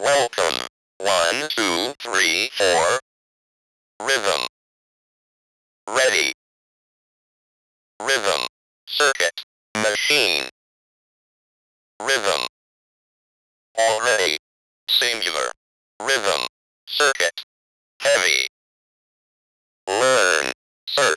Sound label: Electronic